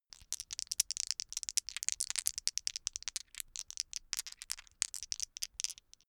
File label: dice shake hands open long